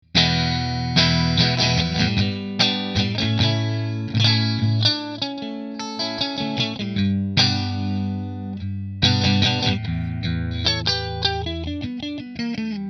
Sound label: Fender Strat Sunburst Position 3 Fender; Fender Stratocaster Sunburst 20th Century American Standard